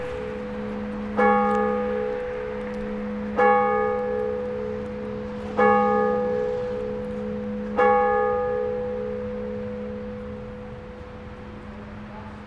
Church Bell Marseille France With Clicks

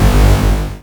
Shield Hit

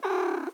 Cat

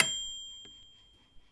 Micro Bell

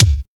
kick popular

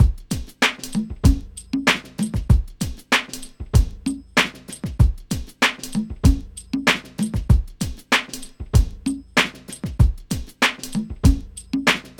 Hip Hop Drum Loop

